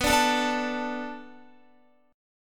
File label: B Minor 7th B Guitar, chord; Bm7 strummed